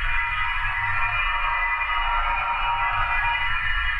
Tonal Re Draw, sparse soundscape

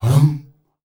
hum hum, S. African world voices; ILIO; Vocal Planet

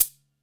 kit, Yamaha Motif-ES8

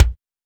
BONUS BD, Drums; Best Service; Real Mega Drums VOL-1

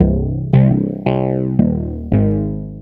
Bass, Futurist Drum & Bass

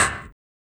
RSS PERC

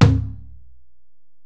Ac Perc, Drum Machines Alesis DM-PRO

